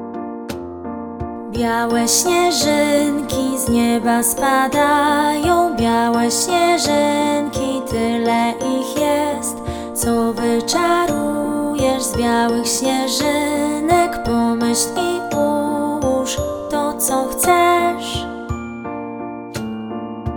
WERSJA WOKALNA

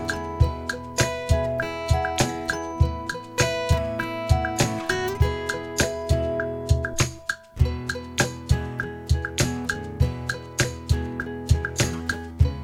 instr, Wersja Instrumentalna

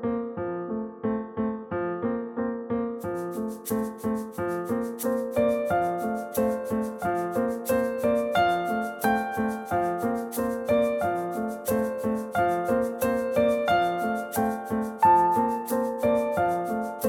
WERSJA INSTRUMENTALNA

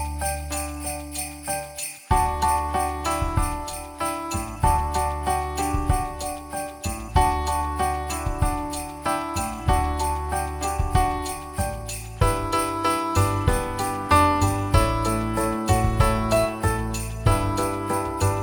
WERSJA INSTRUMENTALNA

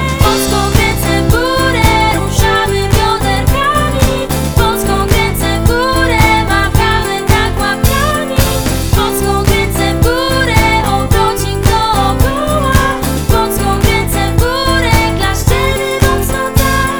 WOKAL; pakiet podskok

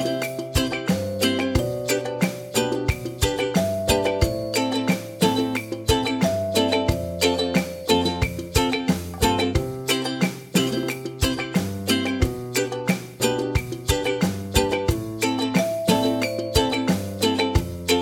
INSTR, Wersja Instrumentalna

